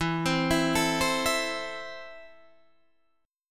E Suspended 4th Sharp 5th, Guitar DADGAD; 14,15,14,14,15,14

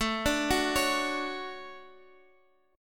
D Major 7th

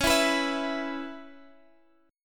E 5th C sharp; strummed; chord

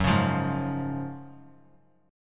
Gb Major 7th Suspended 2nd; GbM7sus2 strummed; JGuitar

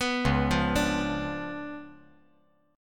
C sharp 7th Flat 9th, chord; C#7b9; arpeggiated; JGuitar

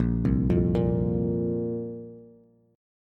Bb Suspended 2nd Bb down Bass; arpeggiated

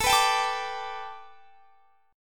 A 7th Suspended 2nd Suspended 4th; A7sus2sus4 strummed

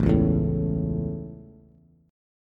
A Major, Bass; strummed

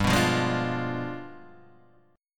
G 7th Flat 9th, Guitar, Half Step Down

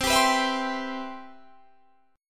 C Major 7th C

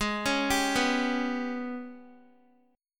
Ab Minor Major 7th Double Flat 5th, Guitar; arpeggiated; 4F,4A,5C,5G,5C,6E; x,11,13,12,11,x; JGuitar

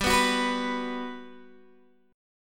G Major 7th, G, down, Guitar, Open D

